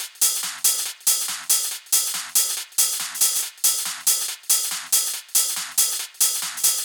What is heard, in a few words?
Loop 140BPM, Drums & Perc; Vengeance Samples; D minor